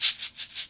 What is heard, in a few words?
171 bpm nice cabasa loop